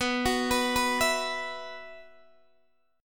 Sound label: B Suspended 4th, Guitar, DADGAD